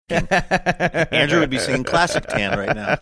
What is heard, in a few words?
laughing saying would be singing classic tan